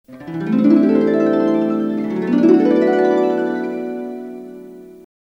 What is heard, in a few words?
Transition, music